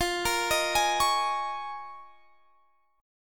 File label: Eb 7th Suspended 2nd Suspended 4th F